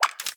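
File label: Weapon Change; Gameplay Wpn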